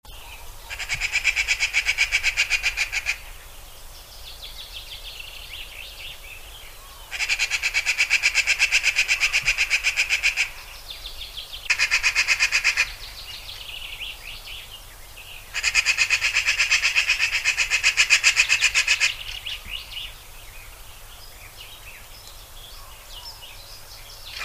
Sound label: Pica pica